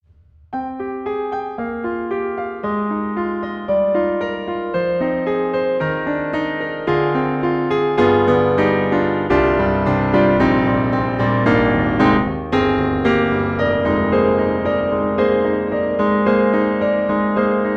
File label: Pianoteq D4 Specious; MODARTT S.A.S.